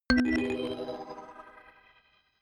notification kalimba magic low

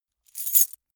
metal keys handling

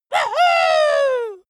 dwarf cheer "wuhu"

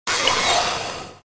hydraulic door

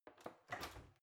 oven open soft

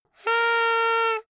hoot

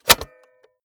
cassette player slide tray insert hard

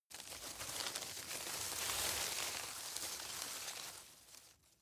grab rustle medium

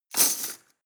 cutlery teaspoons drawer place hard

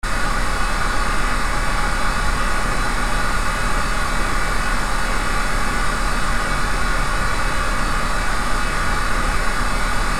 fusion machine cooling unit

